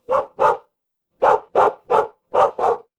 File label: animal dog bark